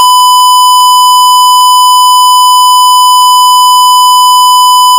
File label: Square wave 1000